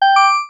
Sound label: notification bright